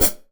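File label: Hi-hat Acoustic pedal; Drums; Acoustic and Electric; Polyend; Simulacrum Sounds; 1 Shots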